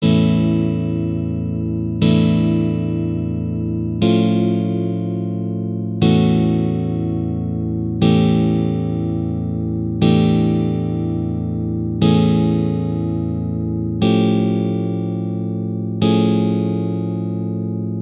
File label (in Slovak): odvodenych akordov Adur